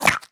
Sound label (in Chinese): 狐狸进食声